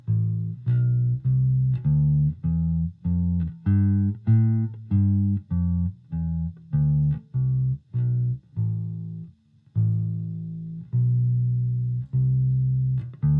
A Minor Scale, guitar

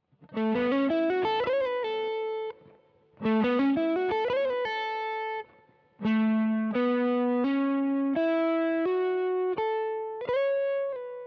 Lick, guitar

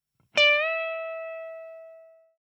15th Fret Bend

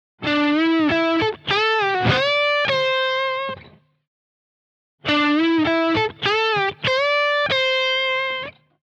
Pentatonic Lick, guitar

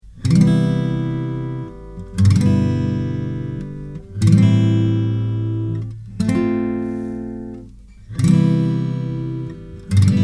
4 chords

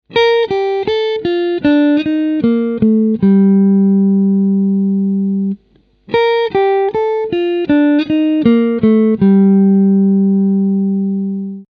Minor Scale Lick, guitar

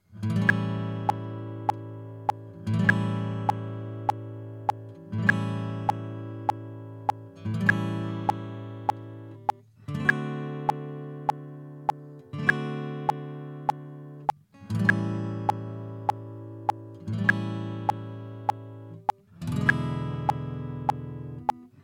A7 Blues progression, guitar

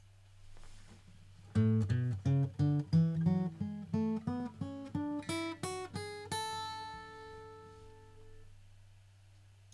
A MAJOR SCALE, guitar

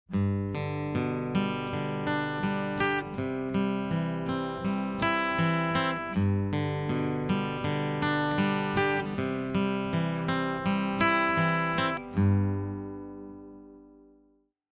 Picking Pattern

